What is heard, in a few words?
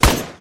famas, guns